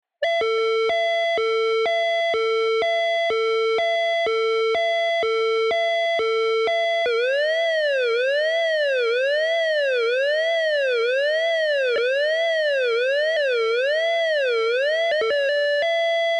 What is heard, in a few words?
siren